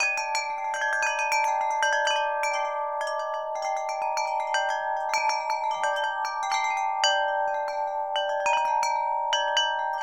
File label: WIND CHIME; AKAI S6000 CD-ROM; WorldSounds; STRUCK CHMES